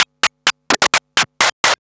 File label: Scratch Fills 140BPM